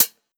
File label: Closed Hat, 909; Slate; Vintage Drum Machines Volume 3 909 Edition; 909 Closed Hats